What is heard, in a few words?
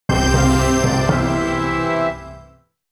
Game achievement success; gfxsounds